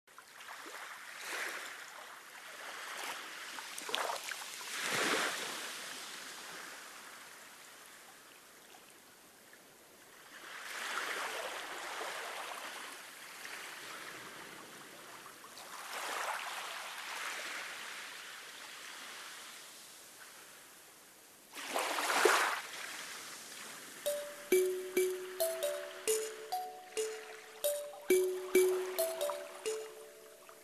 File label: sea